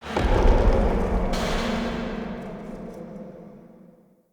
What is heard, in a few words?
Cave Mine Distant Boom